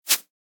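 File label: sandfish attack windup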